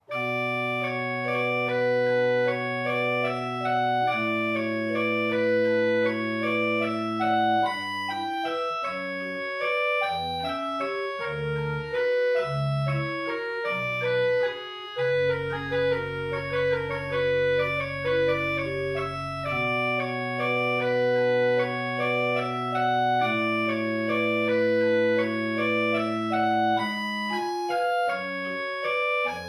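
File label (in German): Schulte, pfeifenorgeln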